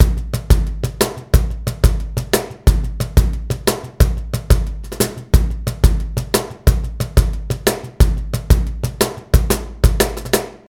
Cajon Coda; Brunobrgs

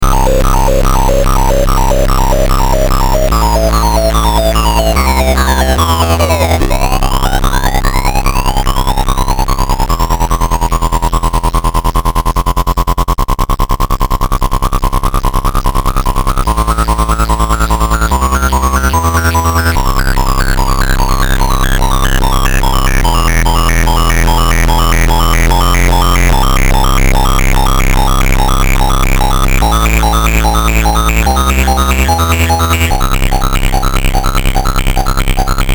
Synth BitStep; Metalthrix; QiTaNo

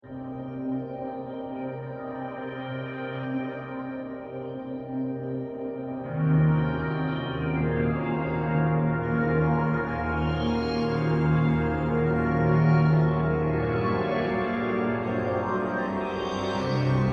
Full Moon Pad; Redux; Renoise 3.1

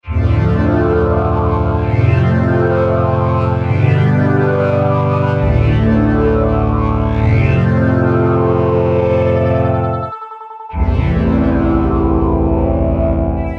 Drum Synth

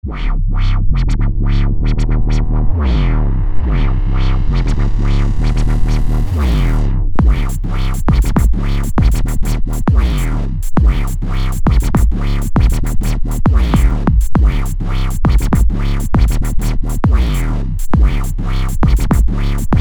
Synth, Wub Wub

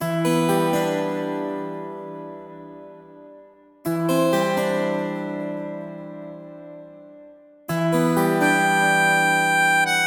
Acoustic Work; Bee Tracks

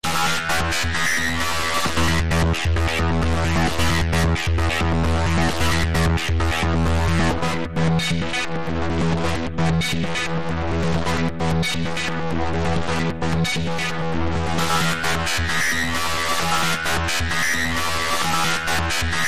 arpeggia

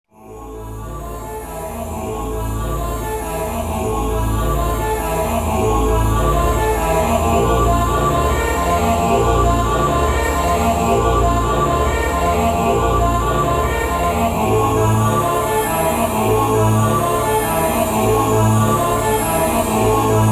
Super Choir

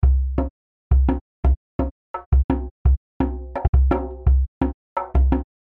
djembe; Raegae

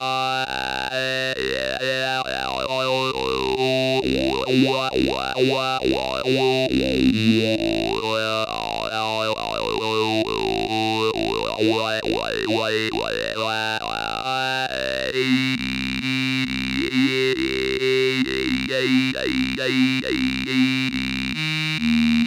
Formant Synth

